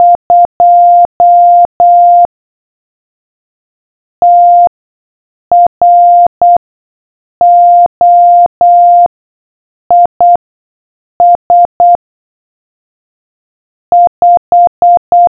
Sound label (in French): Code morse; eCO Challenge Bordeaux